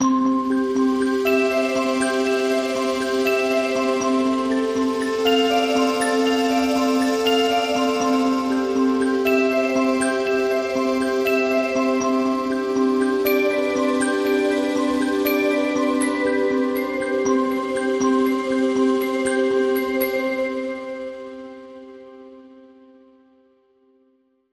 Lullaby Pulse

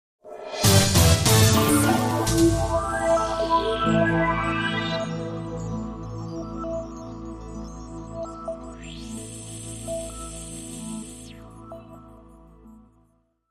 Logo Sting & Tail

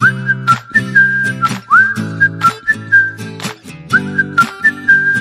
Child Whistle, Sting

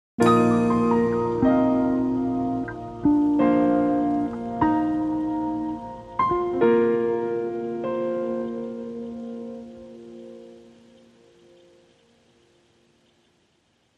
Jazz Trio

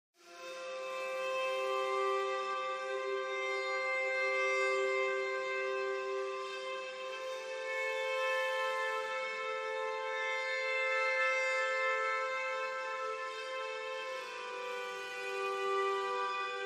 Harmonics

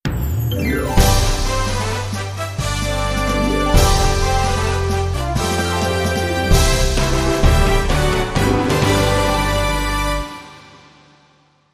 The Dawn Of A New Era Jingle

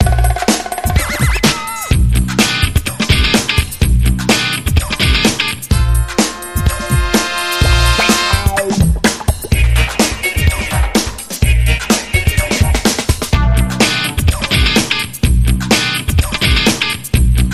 Epic Music Sweep

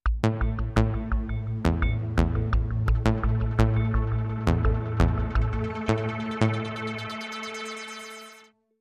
Sting

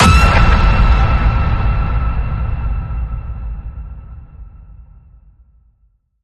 Ping It Loud, E

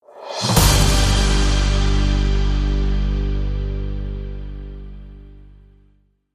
News Sting

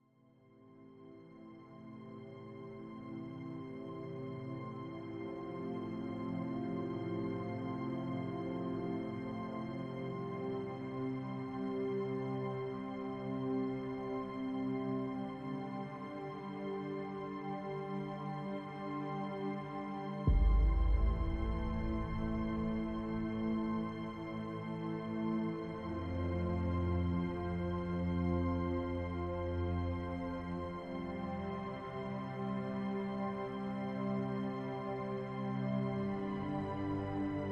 Strings and Percussion Only

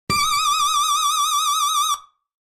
Electric Screamer

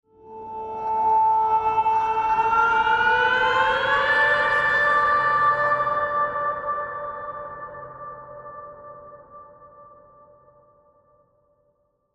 Polar Ice Bend

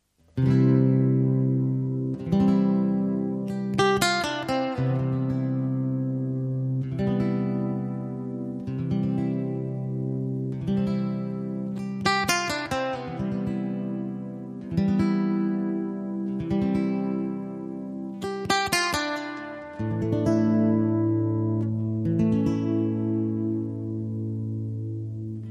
Riff Latino

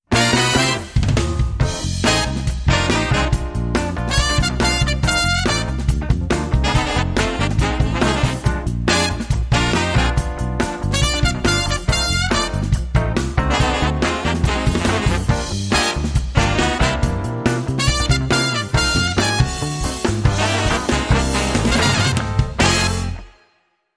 Funk Brass

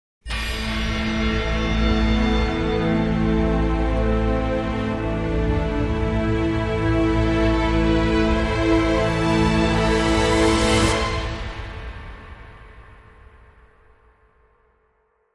Dramatic Sting Five, No T Bell

